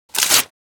Fast paper tear rip quick

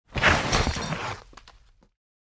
Tactical gear bag put on

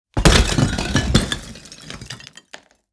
Large block thrown on concrete, brick

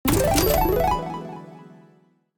Casino slot machine reward payout

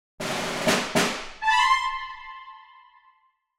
Video game reward chest opening epic

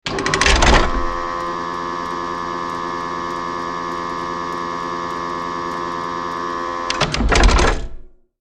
Tank turret moving turning mechanical noise; artillery

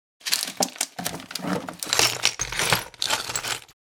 Fishing tackle box

